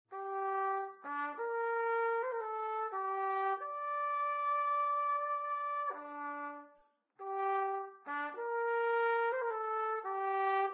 Trumpet Training; Instruments

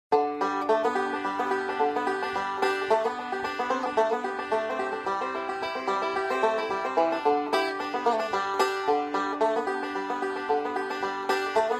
Banjo; Instruments; Zomboid; Lifestyle

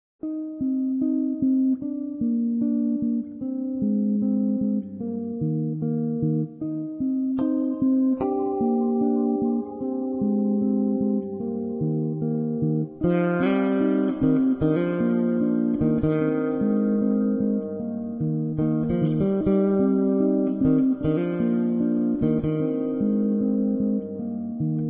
Guitar Electric Bass; Zomboid; Lifestyle

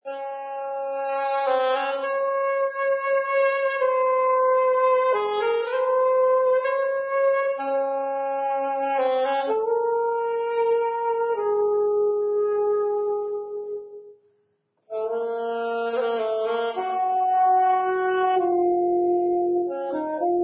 Saxophone; Zomboid; Lifestyle

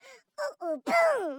Woman Dance Happy; Zomboid; Lifestyle

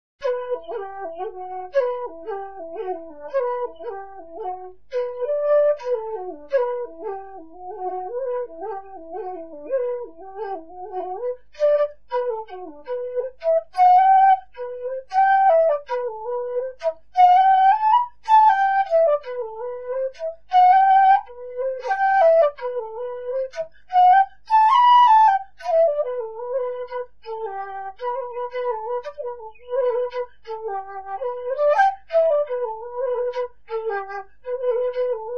Flute; Zomboid; Lifestyle

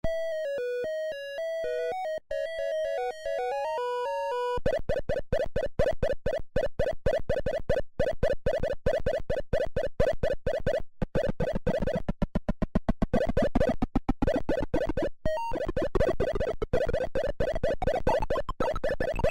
MS Pacman game Atari; Zomboid; Video Game Consoles

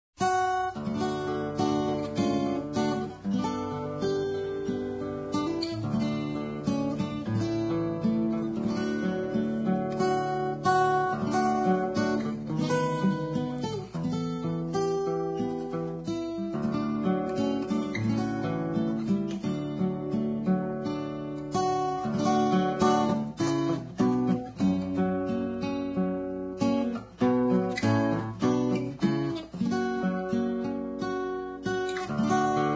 guitar acoustic; Zomboid; Lifestyle

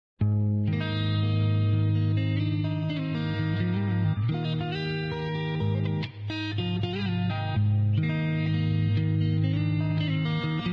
Guitar Electric Midwest Emo; Instruments

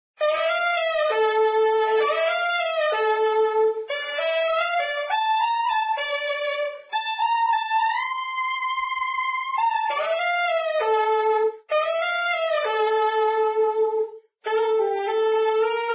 Saxophone; Zomboid; Lifestyle

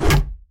2x4 Hit; Scrap Weapons; Zomboid

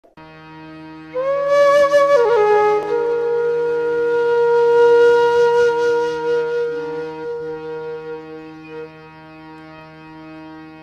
flute; Zomboid; EntertainYourself

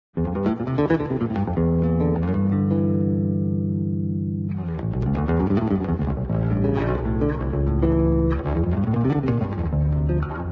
Guitar Electric Bass; Zomboid; Lifestyle

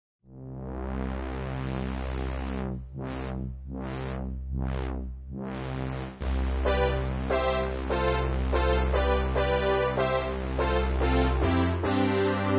Keytar; Zomboid; Lifestyle

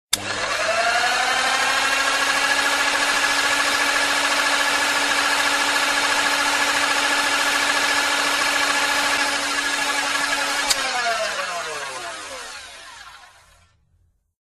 Mixer; Zomboid; Biochemistry

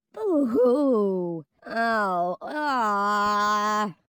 Woman Booing; Zomboid; Lifestyle

